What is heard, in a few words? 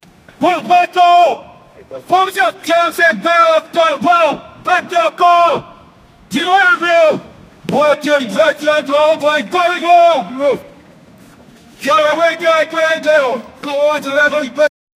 guy fighting on street, arrest; SFX Engine